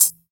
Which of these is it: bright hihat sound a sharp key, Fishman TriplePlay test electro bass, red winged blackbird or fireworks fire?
bright hihat sound a sharp key